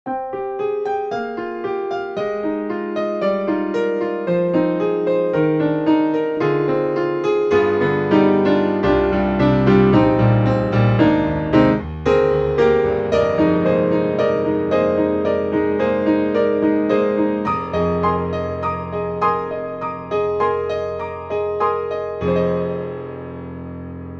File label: C7 Grand (Side); Acousticsamples